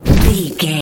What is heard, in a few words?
fireball impact burn